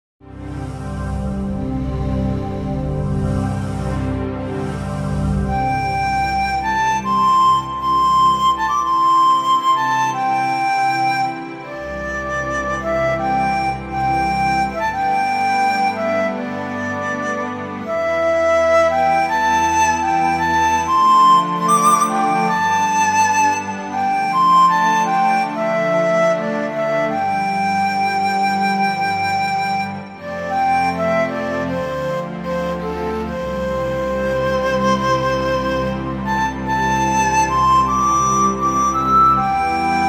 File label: flute strings